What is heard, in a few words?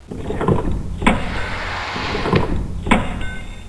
fan